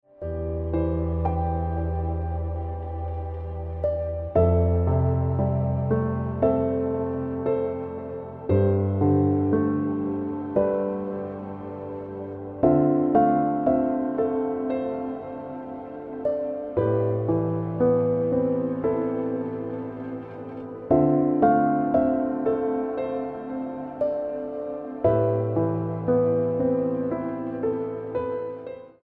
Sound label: Ringtone Piano Music